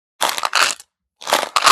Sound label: gum